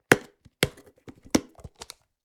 Plastic food container open

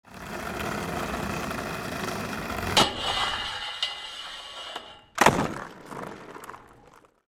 Skateboard grind rail

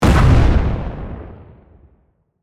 Explosion shockwave impact large powerful

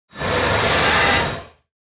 Lizard creature roaring and screaming

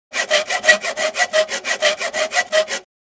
Handsaw cutting iron

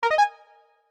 Infographic call answer notification

